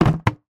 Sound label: industrial traffic cone drop; sound effects one